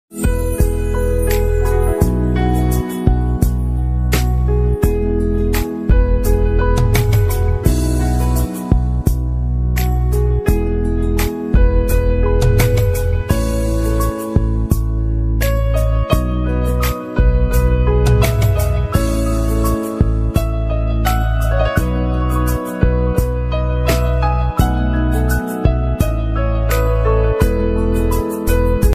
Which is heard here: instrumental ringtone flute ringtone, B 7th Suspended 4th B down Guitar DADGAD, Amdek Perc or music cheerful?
instrumental ringtone flute ringtone